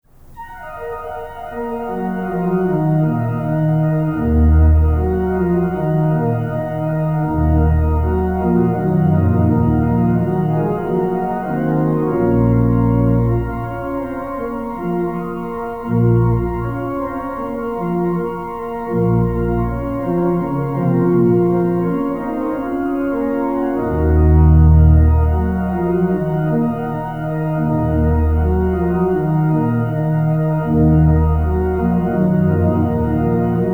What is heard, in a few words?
Toccata in D Minor, organ; organ masterpieces cd